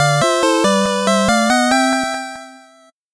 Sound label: 8bit jingle slow